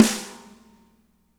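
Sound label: snare right; Spectrasonics; Burning Grooves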